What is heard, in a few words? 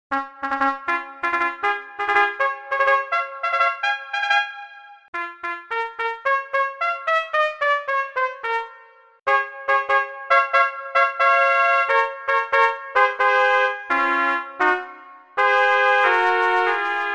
Cornet